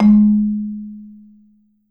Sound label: SOFT G#2, Marimba; Sampleheads; Dave Samuels Marimba & Vibes